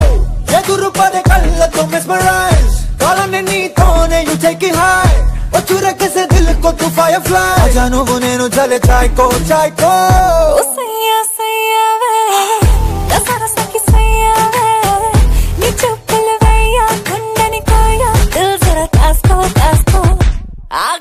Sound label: Telugu Movie Song Ringtone; Saaho 2019